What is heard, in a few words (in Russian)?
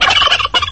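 индейка звонкий близкий короткий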